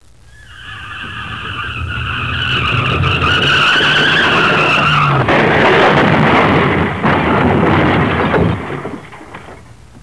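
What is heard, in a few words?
car crash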